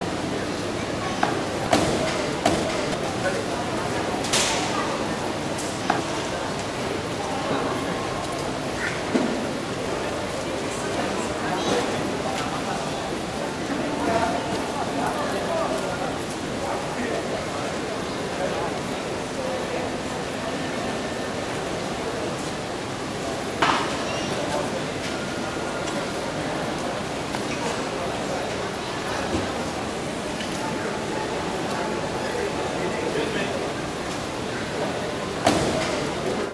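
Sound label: airport immigration; best service Blue Box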